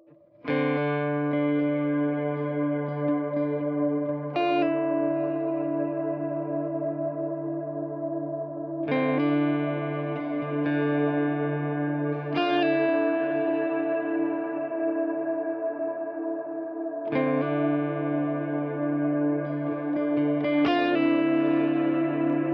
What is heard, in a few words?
Reverb Swell